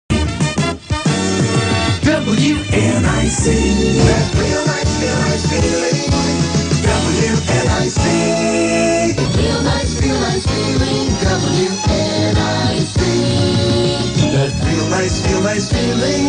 jingles; wnic

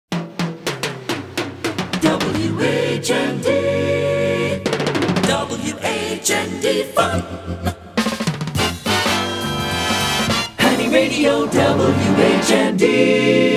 Montage; WHND AM Honey Radio; JAM Dallas

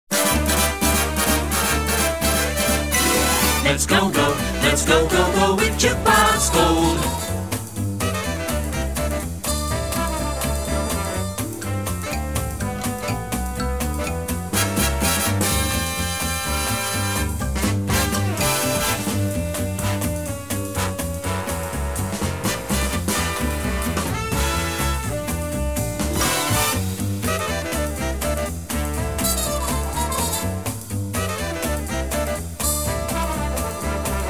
Jingle; Pro Pak; Jukebox Gold

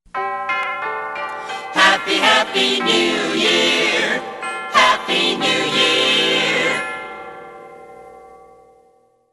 Happy Happy New Year

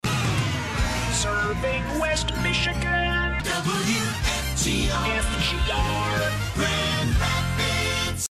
Jingle; WFGR; Grand Rapids

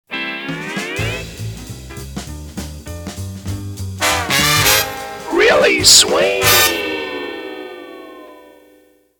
Really Swings; Pams

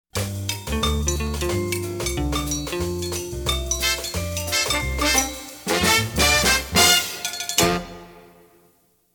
Cha Cha; Pams

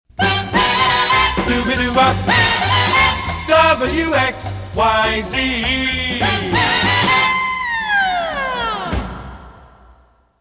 Singers W X Y Z; Pams

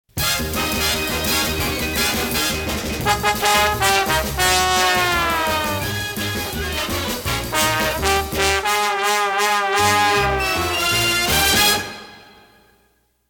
Horns And Wixie Radio; Pams

